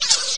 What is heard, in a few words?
Jump; Peahat; Zelda